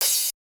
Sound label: cymbal